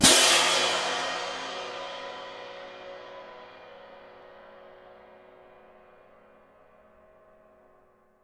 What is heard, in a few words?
CYM, Percussion; Miroslav Vitous - Percussion & Harp